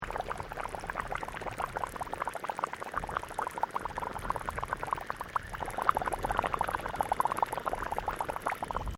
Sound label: Volcanic Water Sulferous Pool Bubbling Loop, Dormant Volcano Japan